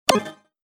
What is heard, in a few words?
menu back; Crimson Stella